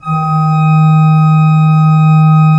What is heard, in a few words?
D2, Cathedral Organ; ILIO; Propeller Islands